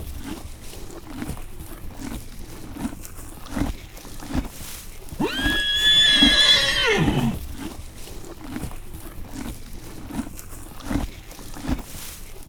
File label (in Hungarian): fűvet legel nyerít, ló; állathangok; paraszt udvar